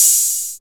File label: Open Hat; Digital Sound Factory Xtreme Lead